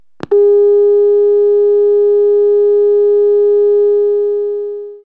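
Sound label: clock alarm; KABOOM! - Wild and Wacky Sound Software; house; clocks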